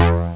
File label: Korg Bass